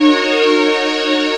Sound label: Horn Pad - 2A; Jungle; Pads; Blu Mar Ten; Jungle - 1989 to 1999